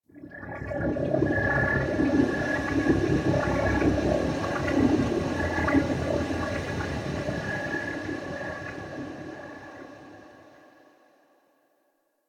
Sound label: Pod Pulse Wave Active; Cinematics; Warframe; Orbiter Cradle